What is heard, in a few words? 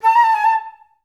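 FL ORMI A3, Flute; Best Service; Peter Siedlaczek's Advanced Orchestra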